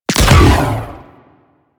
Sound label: Riot Moa Disc Fire; Spec Ops Riot Biped